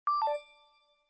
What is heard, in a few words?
Fail